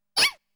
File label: Hit; Namco Game Sound Express Vol.1; Valkyrie no Densetsu